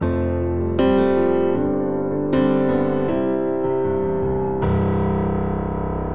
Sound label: Progression Upbeat Rock IV - V - I C Major 78 bpm, Grand Piano; MIDI Clips; Ableton; Factory Packs